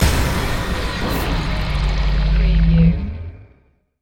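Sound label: Production Element Hit Sweeper; Sound Effects Bible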